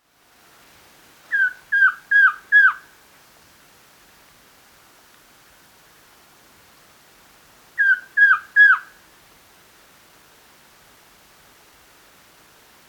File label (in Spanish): Trogon Enmascarado Trogon personatus